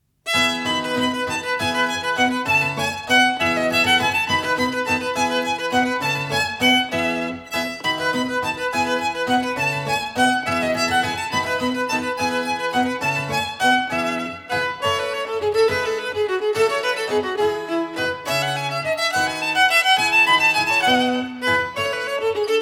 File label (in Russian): Sonatina dlya skripki i klavesina mi mazhor